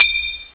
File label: bonus; scoreland